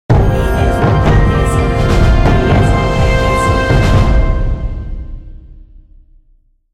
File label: Fight Win; music; Louvre; The Da Vinci Code; XBOX